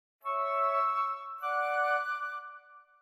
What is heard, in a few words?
Final notes with fade